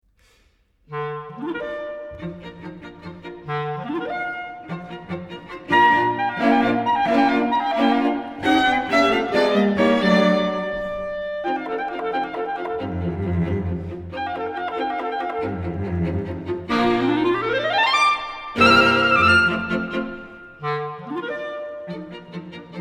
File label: Clarinet Quintet; Quintet for clarinet and strings in B flat-major op. 34; Weber; 3rd mov; Festival Montalcino 2008